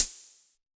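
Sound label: Hi-Hat Open; Yamaha DJX-2B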